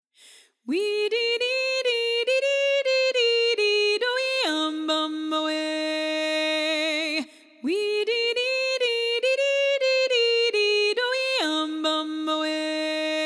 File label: SATB